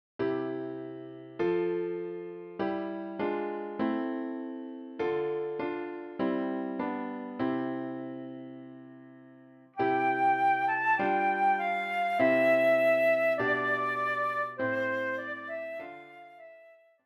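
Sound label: flute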